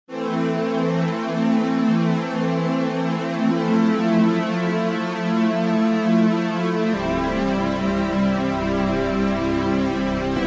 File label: CS80 OLD STUFF